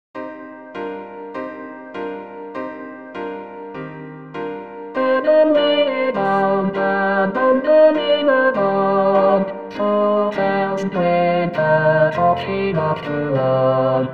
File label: Adam lay y bounden All Voices; Warlock; 2021 Xmas